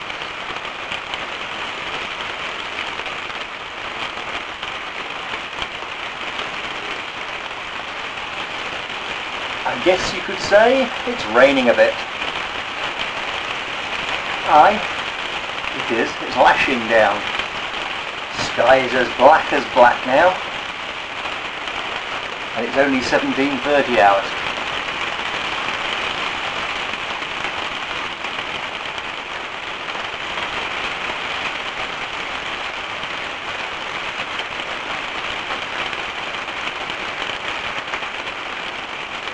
rain

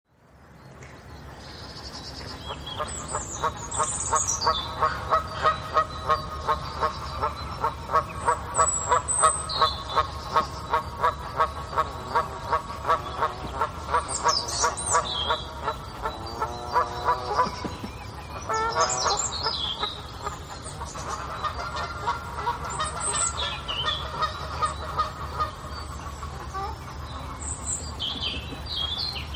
birdsong

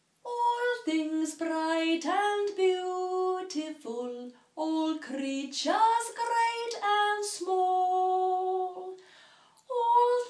heavenly voice; oranmor

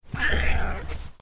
siamese cat with a sore throat

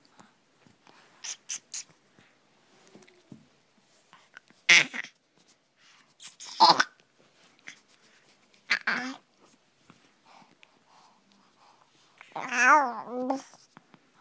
river and tuscan laughing

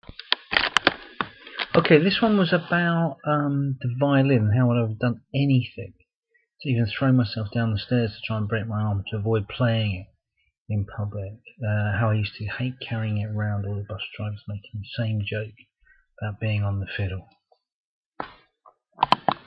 violin

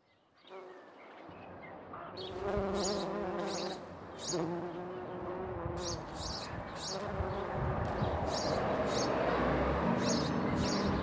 bees sparrow song country garden

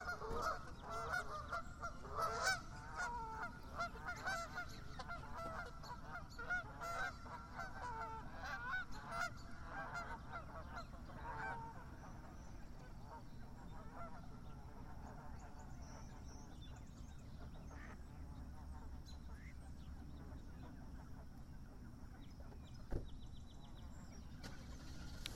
flock of chatty geese

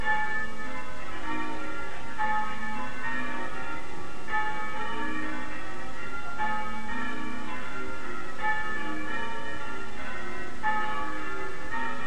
ringing in rainy Richmond

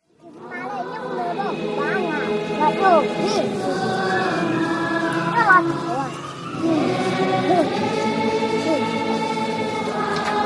church chorus

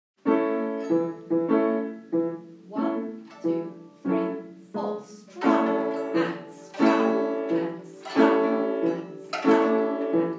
violins; String training programme day 1

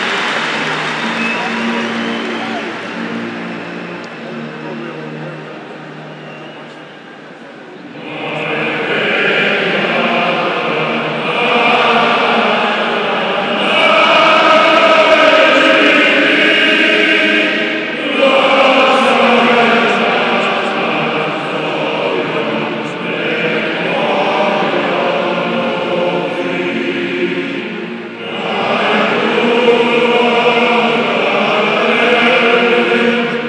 welsh national anthem; wales v ireland 2011

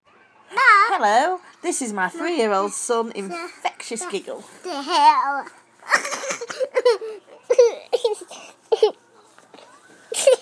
giggle

